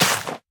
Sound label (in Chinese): 甜浆果丛破坏声